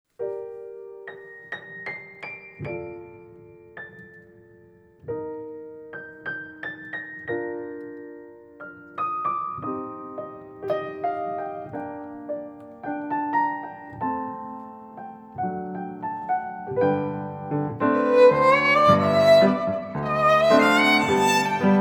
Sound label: Tango Piano Violin; Tango Drinks Reception